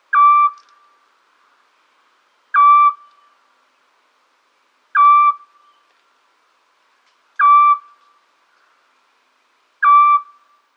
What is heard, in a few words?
Otus scops